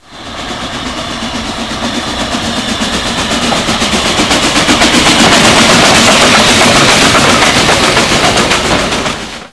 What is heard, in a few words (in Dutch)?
stoom; Corpet24; radio mono